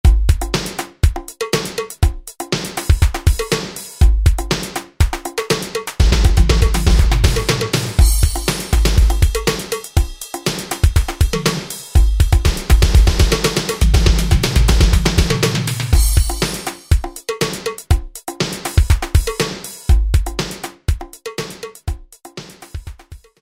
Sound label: Power 90s, Alesis SR-16, User Patterns